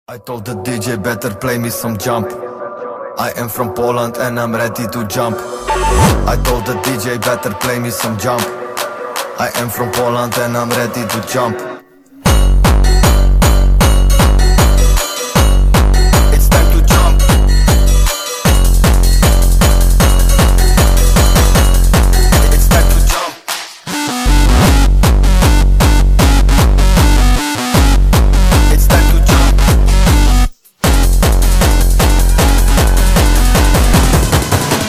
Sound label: polska jumpstyle